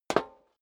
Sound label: step high heels metal dry single stair up; schritte